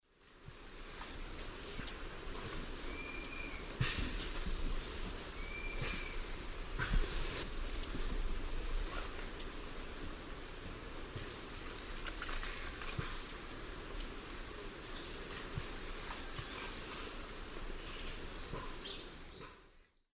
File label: Molothrus bonariensis, calls; S. America; Eastern Lowlands